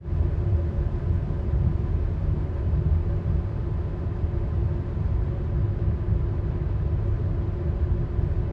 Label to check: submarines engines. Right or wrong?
Right